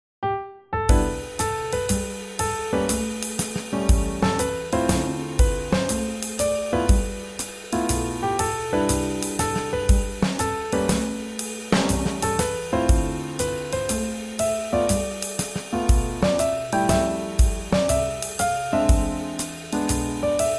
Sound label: Swing Jazz